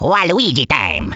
battlecry; waluigi

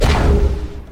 jump pad; ROTT

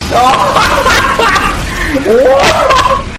hahaha

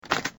hit; scp 173

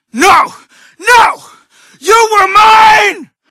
cannibal sight; frank

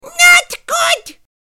fail; freak fortress 2; cream

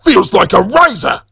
hurt; tf; saxton hale

